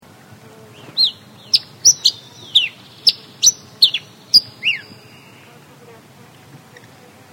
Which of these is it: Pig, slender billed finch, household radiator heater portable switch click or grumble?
slender billed finch